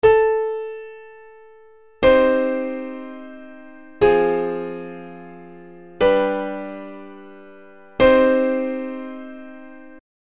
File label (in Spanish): cadencia; sevilla2013